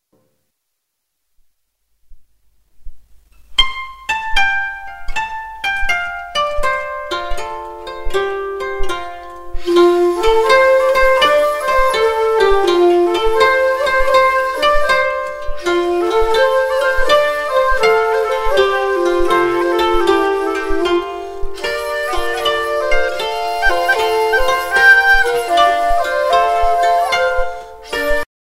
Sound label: shakuhachi koto